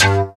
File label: SYN SYN, dance synth; Sound & Vision - Gigapack I CD 2 (Roland)